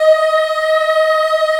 D#4 CHILD EH, Choir; Acoustic & Synth; CHILD EHP; AKAI; USB Soundscan vol.28

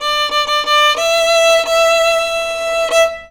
VIOLON, Indian Traditions; USB Soundscan vol.45; AKAI

